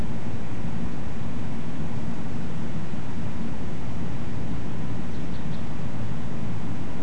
unidentified interesting 3 calls, nocturnal flight call; nocturnal flight call project in beijing autumn 2021